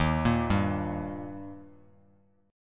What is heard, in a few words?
Eb Major Eb down Bass Standard; arpeggiated; JGuitar